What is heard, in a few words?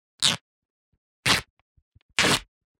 cloth tearoff